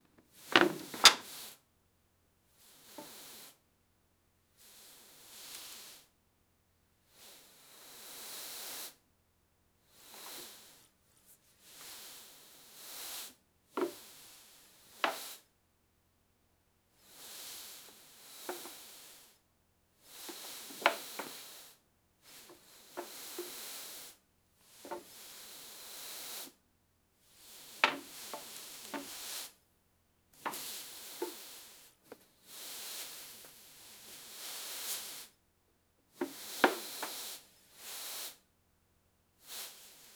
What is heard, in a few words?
dusting a wooden surface, cleaning